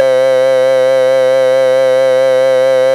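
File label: bell organ C5, Fairlight CMI IIe, keyboards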